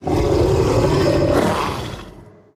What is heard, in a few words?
Enemy Attack Heavy; VO; bloom minigame